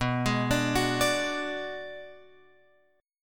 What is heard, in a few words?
B Minor 6th B, Guitar Open F; Bm6 arpeggiated; JGuitar